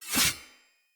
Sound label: Knight Axe Whoosh Stab; Weapons; Enemy; Axes; Snc Knight Axe